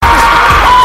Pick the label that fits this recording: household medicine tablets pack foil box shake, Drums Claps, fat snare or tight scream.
tight scream